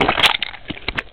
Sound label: Squish; DukeNukem3D